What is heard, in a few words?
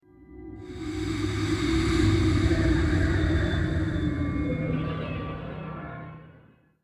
Stinger Decrease Action